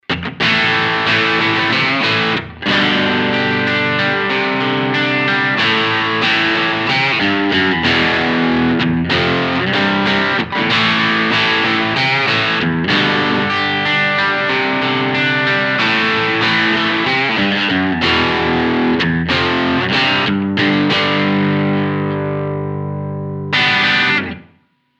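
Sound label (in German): Two Notes Le Crunch kanal b strat steg pickup analoge speaker sim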